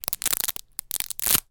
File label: pasta spaghetti dried bunch snap